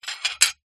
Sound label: household plate broken piece down on other